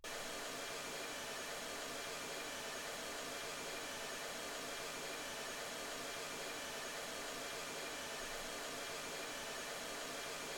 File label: mls16 48kHz